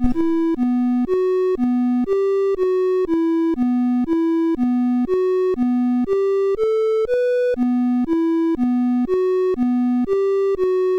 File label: English Tune BASIC sid CSG8580R5